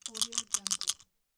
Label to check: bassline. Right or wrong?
Wrong